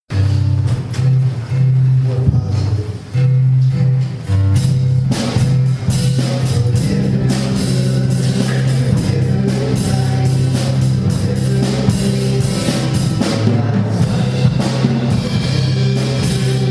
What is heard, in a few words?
Praise Band; Philippians 4-8